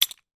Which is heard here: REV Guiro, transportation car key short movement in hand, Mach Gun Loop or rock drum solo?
transportation car key short movement in hand